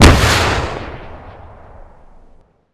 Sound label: rpg fire